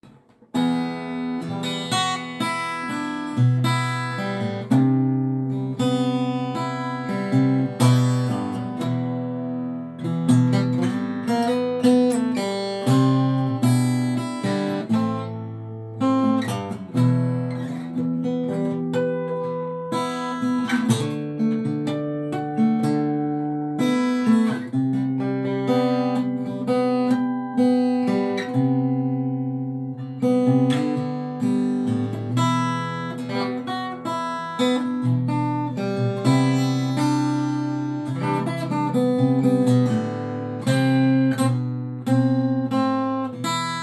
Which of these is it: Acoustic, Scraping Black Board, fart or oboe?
Acoustic